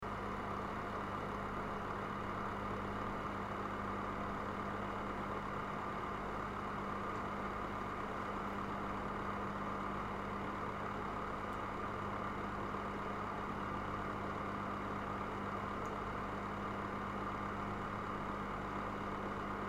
Microwave

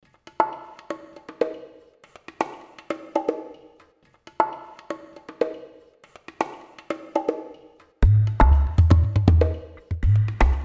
Cinematic Perc Background

